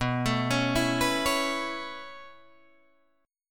B 7th Suspended 2nd Suspended 4th down Guitar Drop D, chord